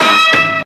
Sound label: bee sting